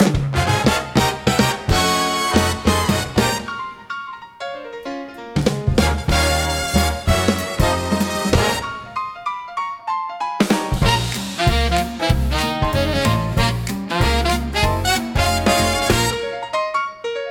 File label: Showtime Brass, big band swing